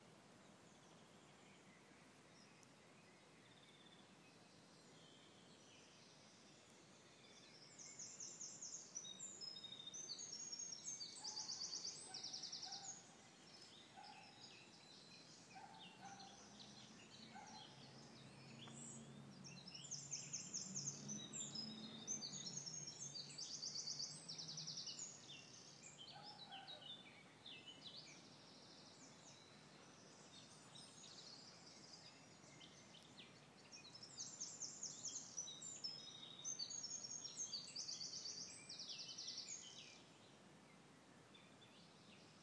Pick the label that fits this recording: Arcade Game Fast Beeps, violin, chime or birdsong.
birdsong